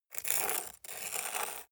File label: scrape stone